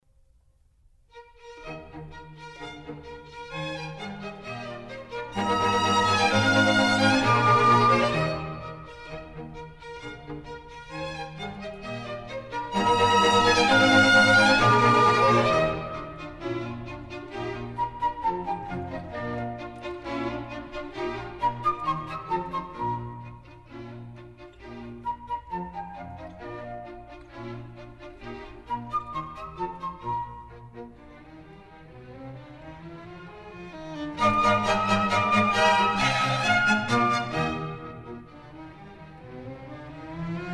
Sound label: Contredanses, Eb Major; Mozart; Dances and Marches